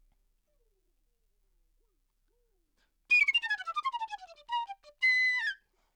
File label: Flute